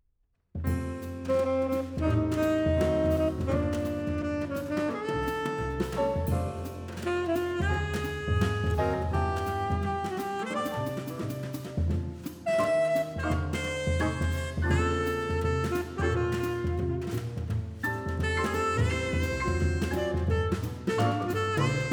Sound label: Neumann KM 84, microphone; Das Mikrofon, Vol. 2; The History of the Condenser Microphone